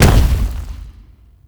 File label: Blunt Heavy Impact Vegetation; Weapon Impacts; Player; Environment